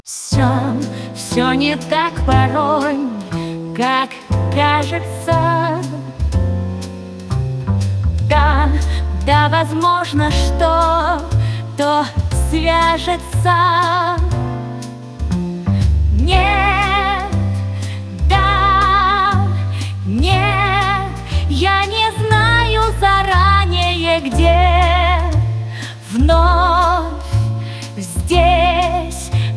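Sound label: Female, Big Band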